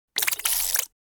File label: Cartoon bubbles squeaking